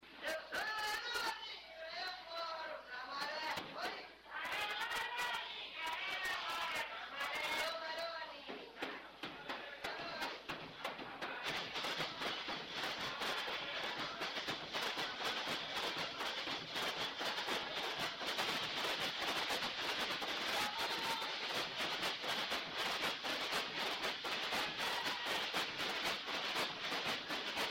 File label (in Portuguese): Batuque Na Maré; Marajo